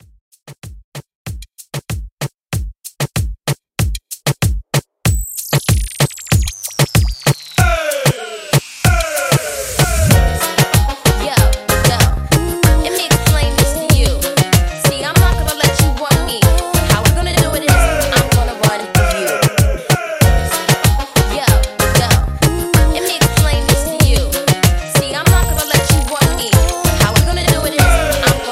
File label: 2000s RB Re Drum 95 BPM